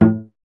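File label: string cello A, Solo Instruments; Miroslav Vitous; Solo Instruments VOL-1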